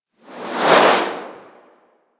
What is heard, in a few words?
Grenade Projectile By; Grineer